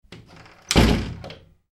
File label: household door double glass panel internal shut, Wooden Glass Panel Double Door Shut; Doors Close - Wooden Glass Panel Double Door Closing; Sliding Doors; McKinneySound